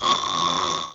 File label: Big Bertha